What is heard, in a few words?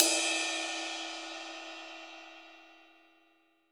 RIDE, Ride Cymbal; MONO RIDE CYMBAL 1; AKAI S6000 CD-ROM - Volume 3